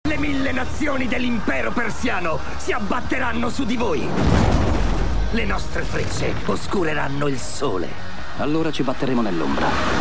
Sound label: Italian Ringtone